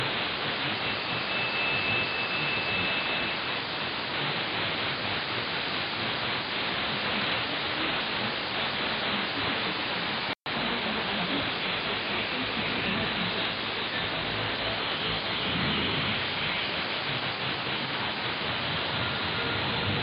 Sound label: China Radio Jammer AM; 9680khz